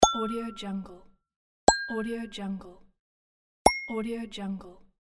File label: pop up ding